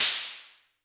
Percussive FX; Vengeance Samples; VEH1 Percussion

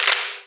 Clap; Vengeance Samples

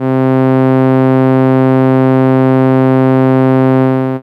Cello C3, ARP Solina; Unmodulated; musicradar-synth-samples

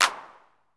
CLAPS, 909; musicradar-synth-samples; Classic drum machines

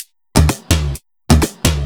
Electro Loop 128BPM; Vengeance Samples

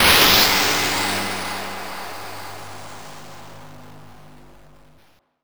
FX Downlifter

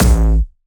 A1, Jumpstyle Kick; Bassdrums; Jumpstyle Multis; vengeance essential club sounds-3; VEC3 Bassdrums

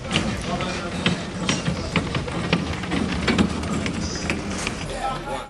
Ambiences, Places and Ambience

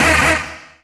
Synths D#; Vengeance Samples

